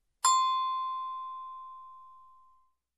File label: Bell Ring